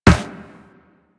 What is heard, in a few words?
Run Metal Perforated, Footsteps